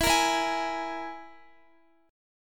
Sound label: E-Major Flat 5th E, Guitar; EMb5 strummed